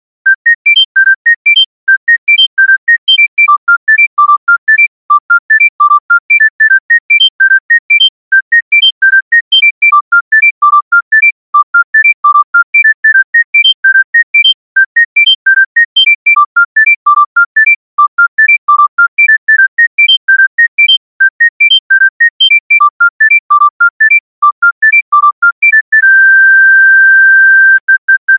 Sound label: panasonic gd 90 ringtone